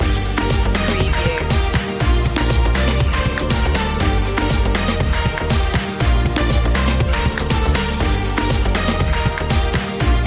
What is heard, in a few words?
latin groove loop; media music now